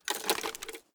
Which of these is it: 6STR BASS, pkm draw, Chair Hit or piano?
pkm draw